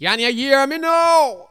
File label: VOX SUNG; Megabass Remix! 2